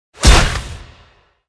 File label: night leader attack, monster